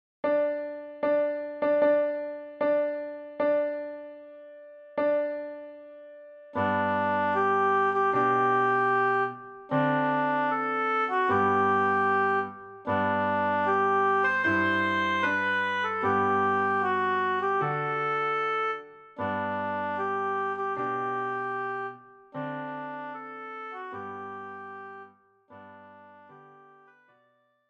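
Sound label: oboe; Hochzeitsmarsch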